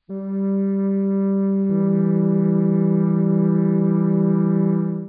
flöte g1 e1; Intervalle, die man durch Potenzen von 2 und 3 darstellen kann

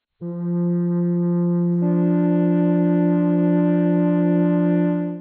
flöte f1 d2; Intervalle, die man durch Potenzen von 2 und 3 darstellen kann

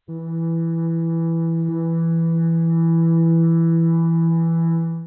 flöte e1 e1; Intervalle, die man durch Potenzen von 2 und 3 darstellen kann

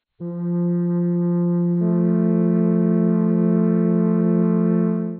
flöte f1 a1; Intervalle, die man durch Potenzen von 2 und 3 darstellen kann

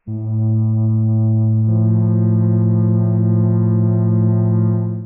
flöte a f1; Intervalle, die man durch Potenzen von 2 und 3 darstellen kann

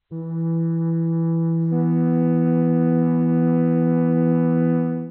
flöte e1 h1; Intervalle, die man durch Potenzen von 2 und 3 darstellen kann

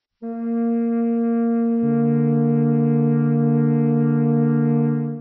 flöte b1 d1; Intervalle, die man durch Potenzen von 2 und 3 darstellen kann

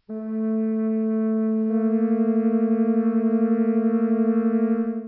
flöte a1 b1; Intervalle, die man durch Potenzen von 2 und 3 darstellen kann

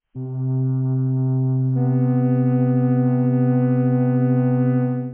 flöte c1 h; Intervalle, die man durch Potenzen von 2 und 3 darstellen kann

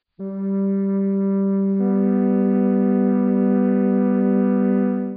flöte g1 h1; Intervalle, die man durch Potenzen von 2 und 3 darstellen kann

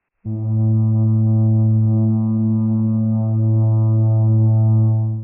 flöte a a; Intervalle, die man durch Potenzen von 2 und 3 darstellen kann

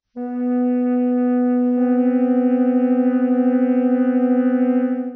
flöte h1 c2; Intervalle, die man durch Potenzen von 2 und 3 darstellen kann

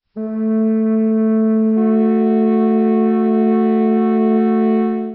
flöte; gleichstufige Temperaturen; VI. Annäherung dieser Intervalle durch gleichstufige Temperaturen

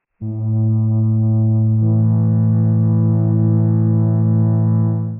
flöte ae1; Intervalle, die man durch Potenzen von 2 und 3 darstellen kann

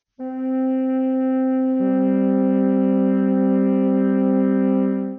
flöte c2 g1; Intervalle, die man durch Potenzen von 2 und 3 darstellen kann

